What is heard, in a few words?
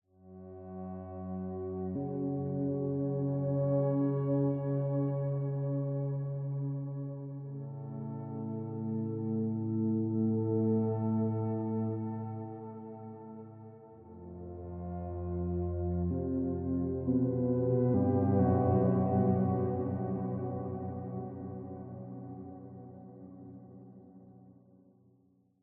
ambient